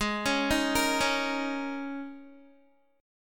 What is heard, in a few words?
G sharp Suspended 2nd Suspended 4th G sharp Guitar; arpeggiated; 16,16,13,15,13,x; 4E,4A,5D,5G,5C,6F; G#sus2sus4